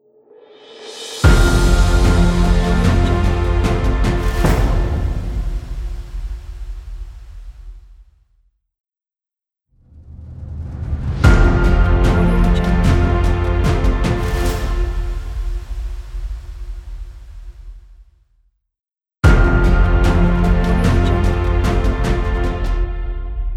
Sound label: logo dreamy motivational cinematic